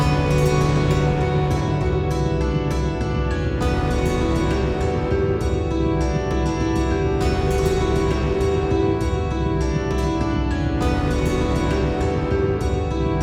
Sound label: Loop; PFE OmniVR